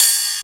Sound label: Cymbals Ride; Vengeance Samples